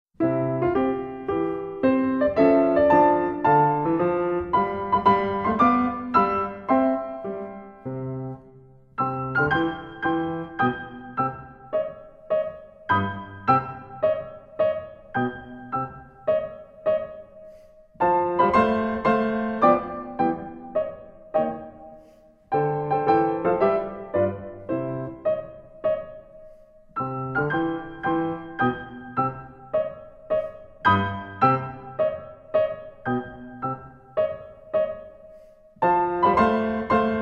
Beat; Mot doi kien

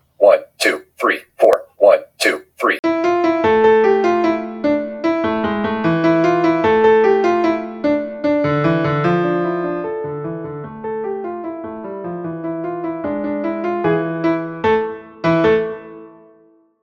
Tango 150 BPM x2 count in

